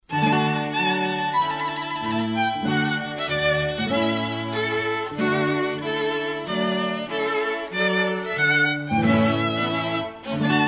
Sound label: trumpet tune